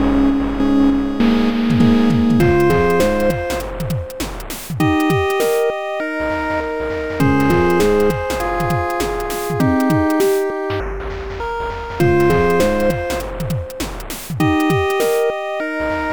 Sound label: Internal Percussion; Cave Story